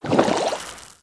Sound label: Soda Rise; candy crush jelly saga gamerip